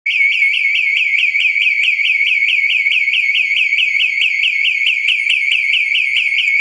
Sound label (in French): alarme